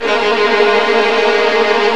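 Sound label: VLN TRILL, Maj Min Violin Trill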